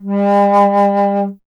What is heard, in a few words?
Alto Flute, Solo Instruments